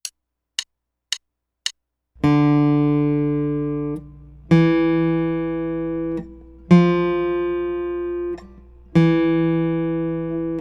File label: D Whole Half And Quarter